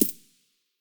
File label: aug silencer mono far, weapons; STALKER; IWP